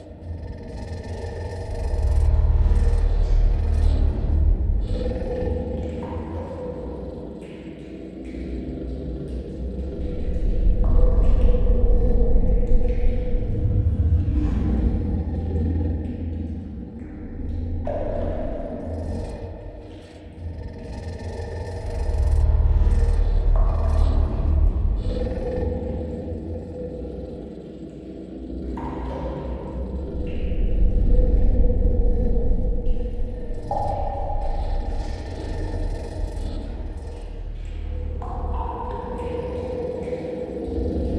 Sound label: Ambience; Springtrap; Five Nights at Freddys; FNAF Special Delivery; android ios